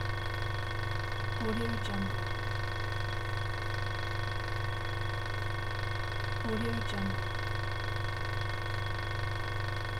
refrigerator old; tsymbal

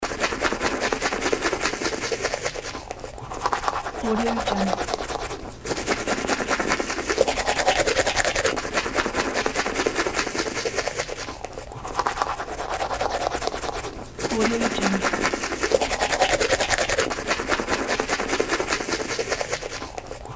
brushing teeth

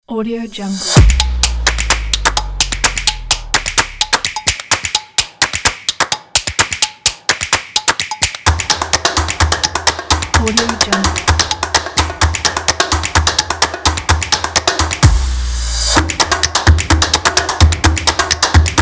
percussion claps pack

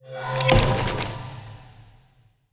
ui unlocks purchase loot crate transition; esmteam

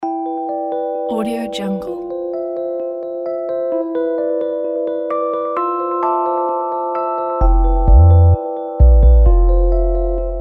melancholy; evokesound

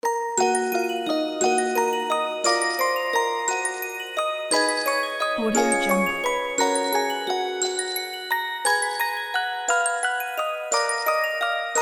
christmas bells

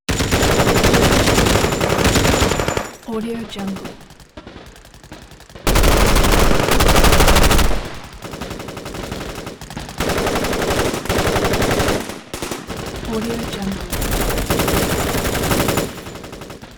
warfare rifles

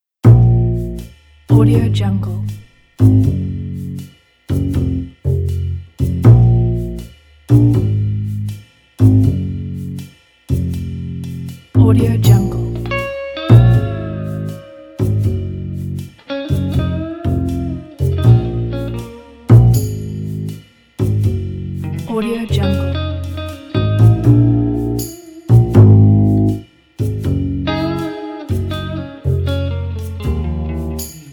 blues

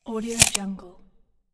tape measure retract

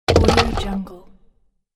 wood pieces heavy fall; fxprosound

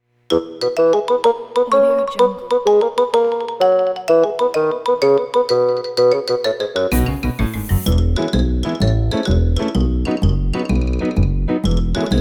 playing hide and seek

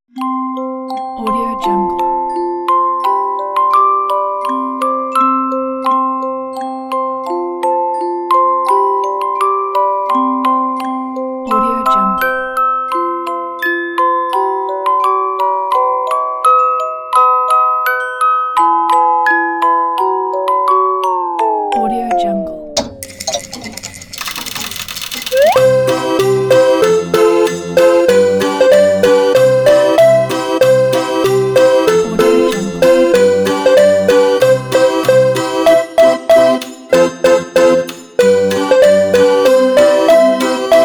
toys

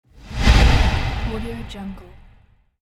low transition effect

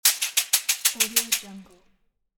shake

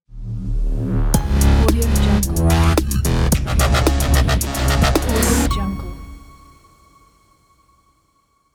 transform technology logo

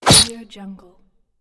knife stab

